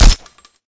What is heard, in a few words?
P90; blockade classic; P90 SNOW